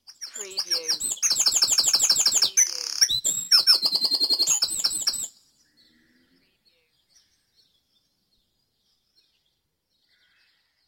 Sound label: Dawn Chorus in Mold North Wales